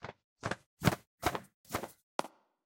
Fly in; Tsutsukera Fly in001

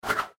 Gate; Google Snake

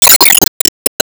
Enemy Gear Active Shot; disney star smash android ios mobile gamerip 2020

